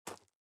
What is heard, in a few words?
SFX run gravel; out of reach treasure royale 2020 gamerip pc